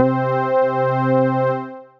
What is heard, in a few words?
Granite Piano, Synth Keys; Instruments; Ableton; Synth Essentials; Operator